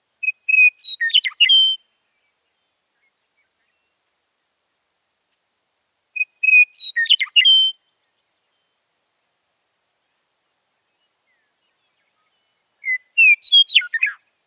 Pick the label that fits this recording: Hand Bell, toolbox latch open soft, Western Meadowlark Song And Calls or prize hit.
Western Meadowlark Song And Calls